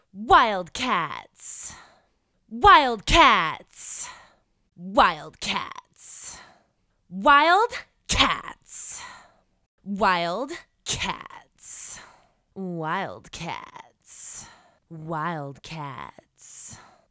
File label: wildcats raw vocals